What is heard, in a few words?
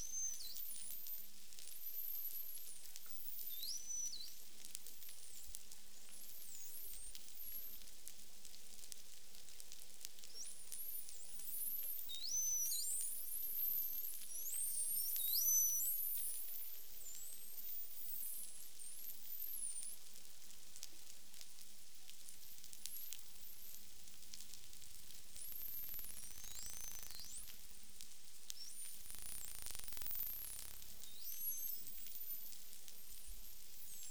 dolphins; sanctsound